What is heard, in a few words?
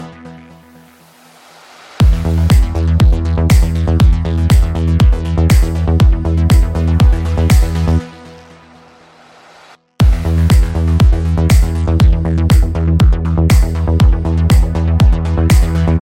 dj remix ringtone